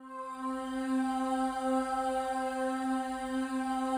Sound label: PAD, DIGI-PADS